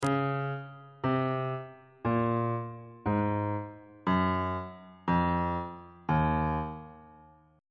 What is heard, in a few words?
e flat dorian mode descending